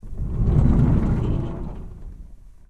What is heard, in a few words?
Slide Door Open